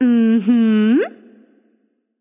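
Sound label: disagree, female; smite; voicepack; hera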